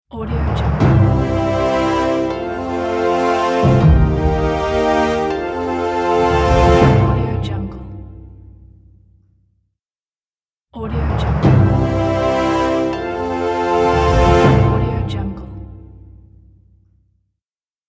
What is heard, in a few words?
achievement logo pack